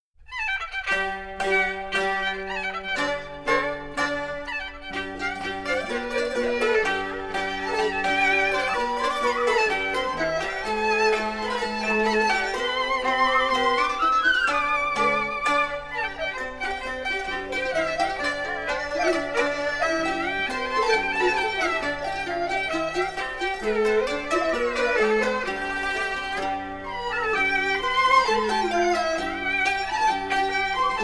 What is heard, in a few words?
Cantonese Music Ensemble; CHINA